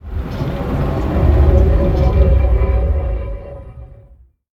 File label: Giant Flywheel Stop